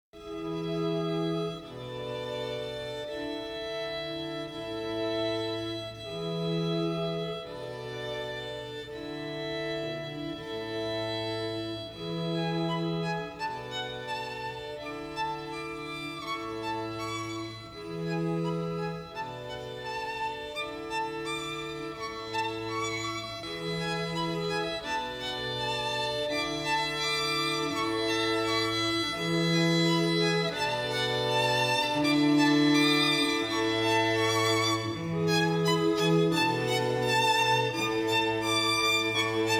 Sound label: String Quartet